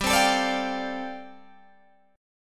G 7th Suspended 2nd Suspended 4th, Guitar Open G

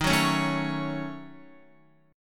Eb Minor 7th Flat 5th Eb down Guitar; Ebm7b5; strummed; JGuitar

